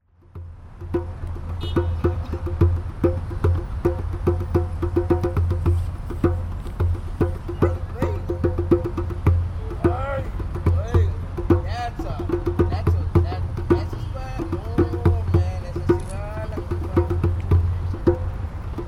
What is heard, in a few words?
Djembe Drumming